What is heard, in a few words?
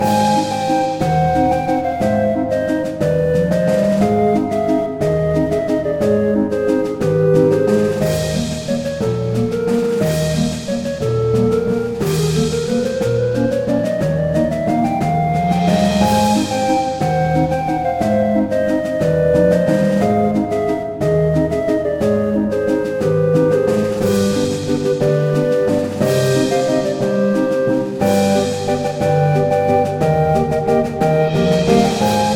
Carousel; west of loathing